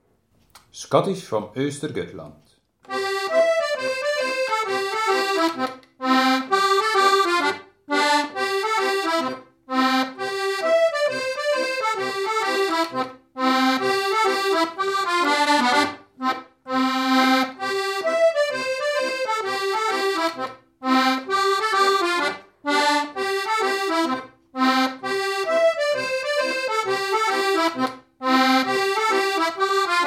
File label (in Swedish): schottis fran ostergotland